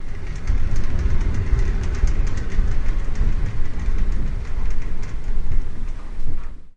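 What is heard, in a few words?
Shower Door Glass, Shower Door, Glass; Slide Fast With Roller Bearings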